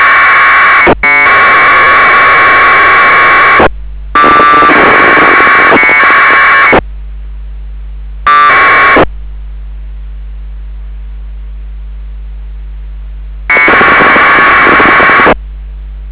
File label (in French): packet, signaux